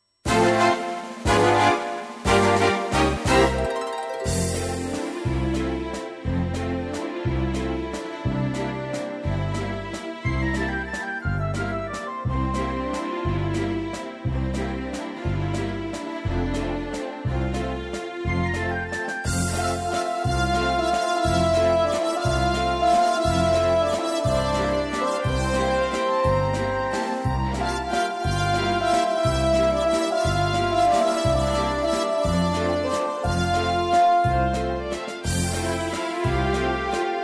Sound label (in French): valse